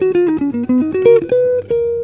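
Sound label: electric guitar melody; Best Service; World Colours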